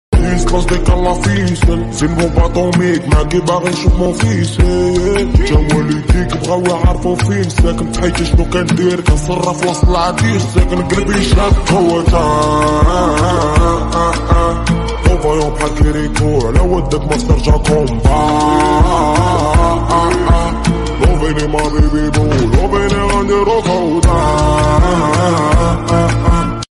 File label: remix bgm ringtone